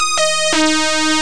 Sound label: Brass; DR.T. midi rec. studio 1.1